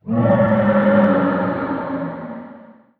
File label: blade dance layer; weapons; dota; juggernaut